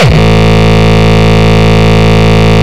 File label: Longkick, kicks